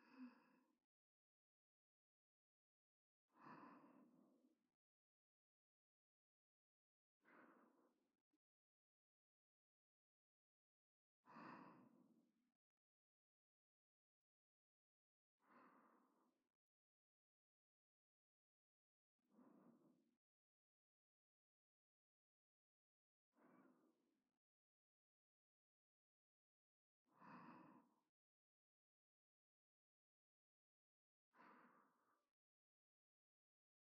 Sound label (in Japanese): 寝息 反転